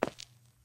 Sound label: tile, footsteps; Garry's Mod; hl2